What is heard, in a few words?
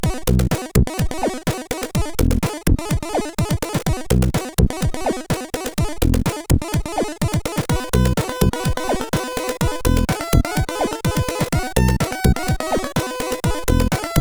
Arpeggio, CSG8580R5; hvsc